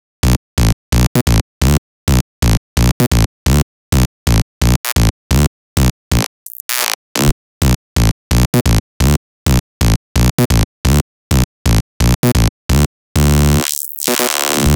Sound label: Bass, EDM